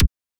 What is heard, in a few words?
Kick, techno drum